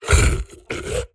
Monster Pain Death

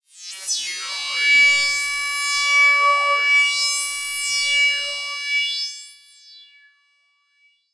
sound design synth harsh melodic high pitched

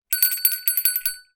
bell small decorative bell ring

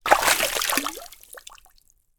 water hand lift out drips

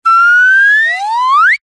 cartoon slide whistle ascend climb medium

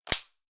foley christmas cracker pull snap; zapsplat

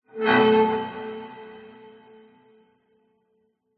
horror stab dark metal scrape short

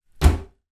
flannel wet throw into bath

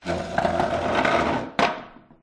pub wooden chair scrape tile floor; field reports

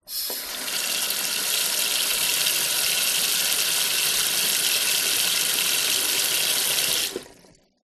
tap run kitchen sink onto dishes plug out; household; zapsplat

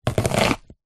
food chop onion slow single slice with knife

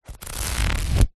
household book paperback pages flick through

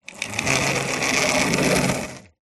toys lego vehicle roll wood rubber tyres

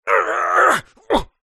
human fight karate vocalisation male

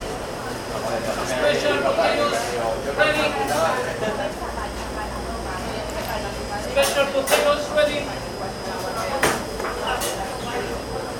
ambience restaurant cafe morning nyc; sound effects one

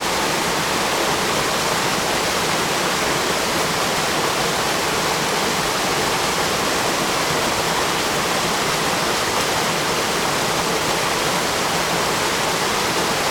water waterfall medium; sound effects one

